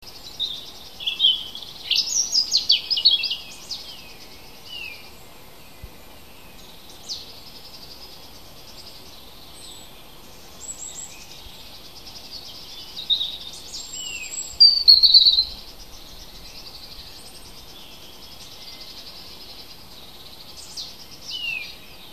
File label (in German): vogel heimisch kurz; biologie; wald; april